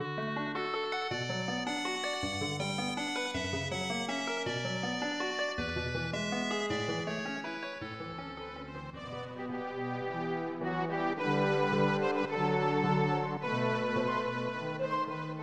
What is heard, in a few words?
Ending Interval; snes; Heracles no Eikou IV Kamigami kara no Okurimono